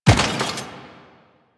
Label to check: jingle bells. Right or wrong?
Wrong